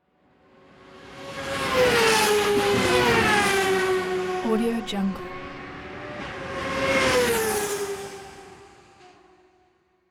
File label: three indy cars pass by; hollywoodedge